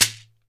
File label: SHEKERE, Synclavier Percussion; ILIO